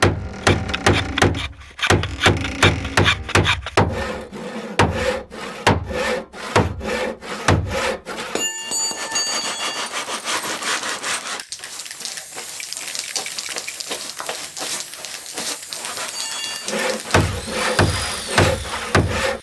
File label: build, Farming; Cosmic Rage; The Experience-light